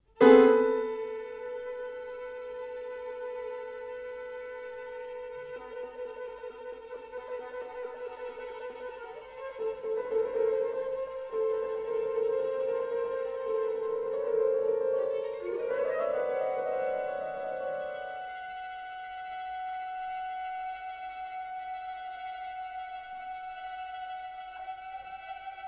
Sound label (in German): quartett